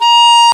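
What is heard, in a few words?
clarinet mid high, FAIRLIGHT CMI IIX REEDS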